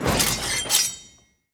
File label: Gunsmith Upgrade